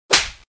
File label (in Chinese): 轻耳光